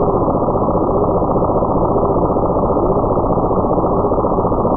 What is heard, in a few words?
Tractor Beam Loop; SFX Kit; Sci-Fi Loops